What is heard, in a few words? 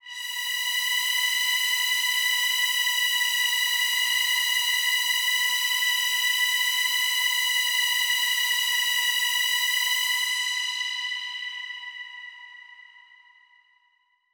Big Pad B4; KG MegaSaur